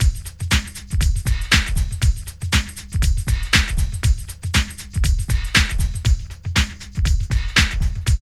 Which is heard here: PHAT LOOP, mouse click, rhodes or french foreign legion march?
PHAT LOOP